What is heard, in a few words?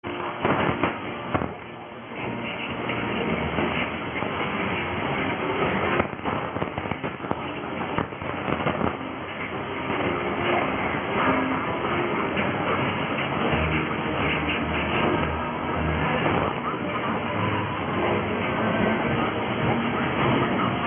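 RAI Italy sign off National Anthem, radio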